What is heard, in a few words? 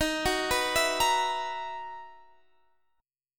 B Major 11th D sharp, guitar